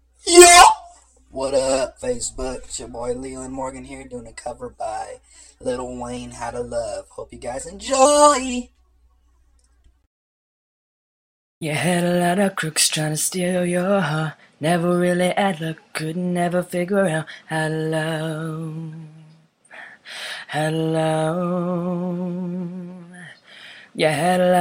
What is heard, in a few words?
me singing